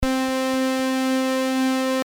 Main Lead fx

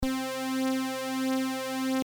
Jump Brs vco

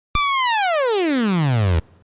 mono port gliss

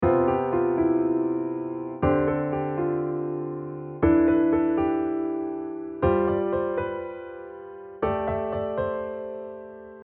7th sus4

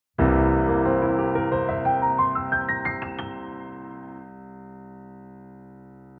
ARP C7

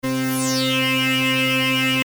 Noise Lead fx

